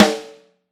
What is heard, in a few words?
RS, Snare Drum; Q Up Arts; Johnny.C's Vintage Snare Drum; 7X14